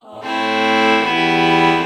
ah violin chord morph

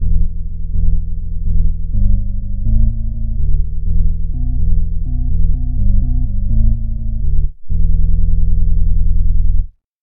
drawbar organ bass

